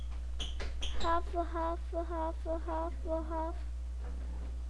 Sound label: harp